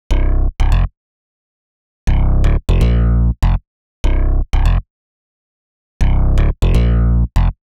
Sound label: Cmin Freak Bass